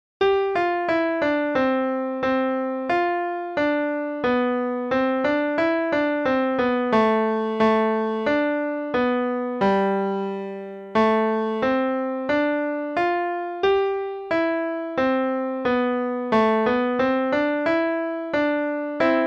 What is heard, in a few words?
Starlit Melody (qn=180, no click); Faber Adult All-in-One; Adult All-in-One Book 1 - page 051